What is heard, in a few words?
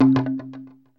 RATTLE; DRUMS & PERC